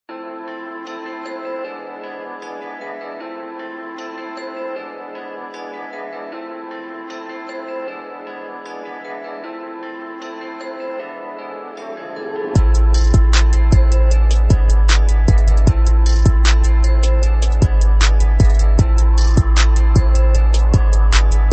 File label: Trap Kits; BVKER Medicine